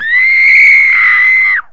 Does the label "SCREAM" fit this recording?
Yes